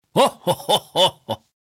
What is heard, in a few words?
panda Fist Pump; shangrila